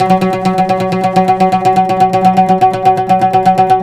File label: PLK OUD, Roland LA Composer